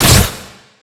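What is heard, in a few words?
Se Combat Bad; Etrian Odyssey I HD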